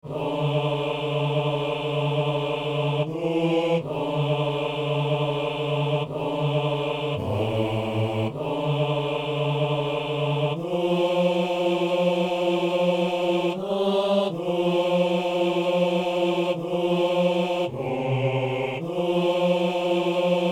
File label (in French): messe doxologie du canon basse; Messe inaugurale